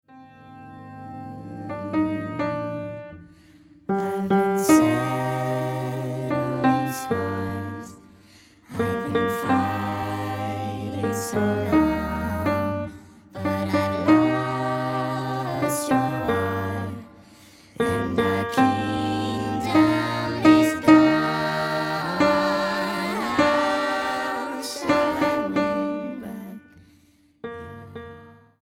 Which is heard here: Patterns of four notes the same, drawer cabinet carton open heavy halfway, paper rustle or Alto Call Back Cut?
Alto Call Back Cut